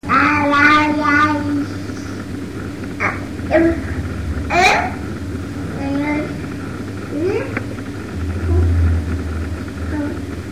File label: squeek, baby; 13 mnths